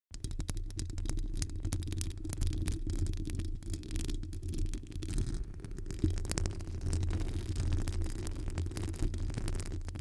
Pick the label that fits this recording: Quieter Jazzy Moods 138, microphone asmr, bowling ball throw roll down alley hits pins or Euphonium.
microphone asmr